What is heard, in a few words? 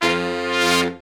BRS SHRT, R&R Horns, Sect; Roland L-CDX-03